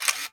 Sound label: camera shutter release nikon f4; technology; sound effects; sound effects one